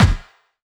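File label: snare drum bass drum, Dance Mega Drums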